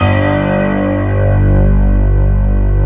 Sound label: piano pad G1